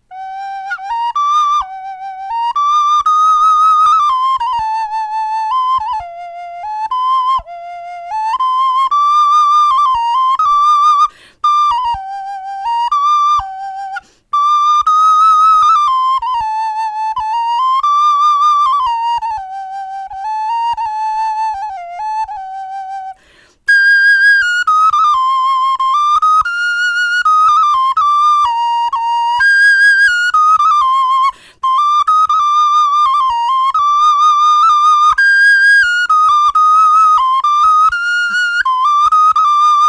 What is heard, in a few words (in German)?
melodie, knochenfloete